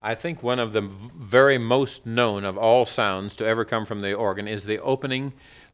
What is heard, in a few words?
organ